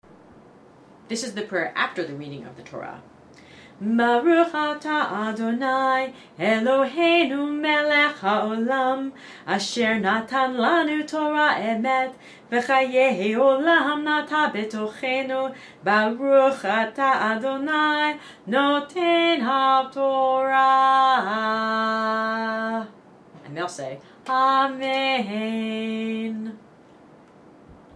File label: Prayer after reading of Torah; hebrew prayers